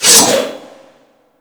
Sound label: Great Sword Swipe; Warframe; Weapons